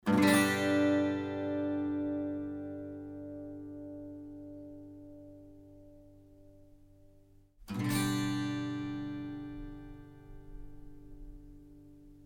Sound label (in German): stereomikrofonie gitarre